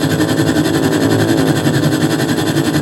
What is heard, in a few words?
game heli